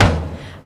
wet tom e key, Royality free tom drum sound tuned to the E note. Loudest frequency: 971Hz